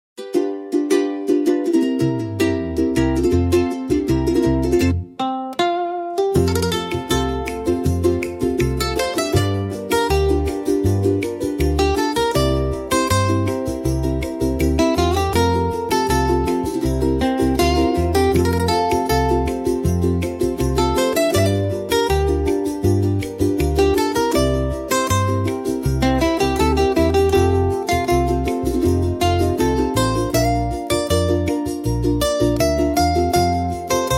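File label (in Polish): Muzyka Hawajska; Mele Hawaii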